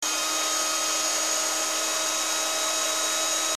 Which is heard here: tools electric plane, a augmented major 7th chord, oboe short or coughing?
tools electric plane